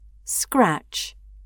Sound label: scratch